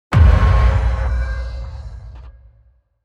hit big

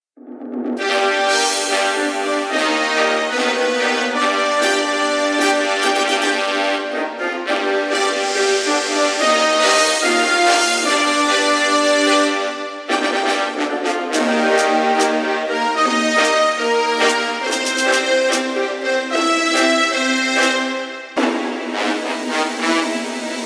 fanfare

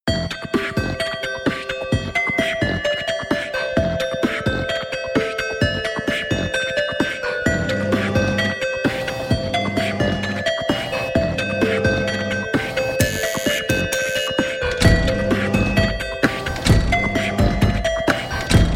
appliances drums

